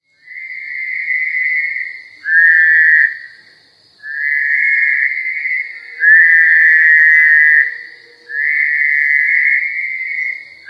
Fowler's Toad; herps of NC; anurans

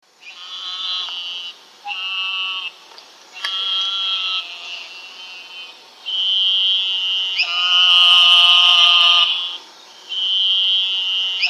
Eastern Narrowmouth; herps of NC; anurans

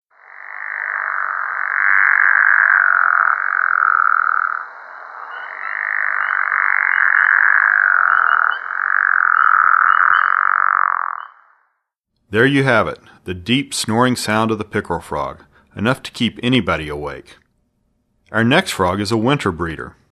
Pickerel Frog; herps of NC; anurans

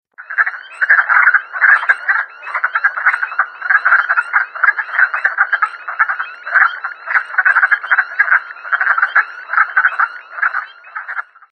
Wood Frog; herps of NC; anurans

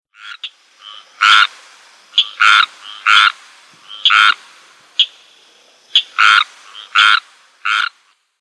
Squirrel Treefrog Breeding Call; herps of NC; anurans

